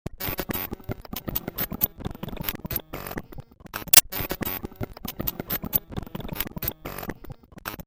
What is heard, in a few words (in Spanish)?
bucle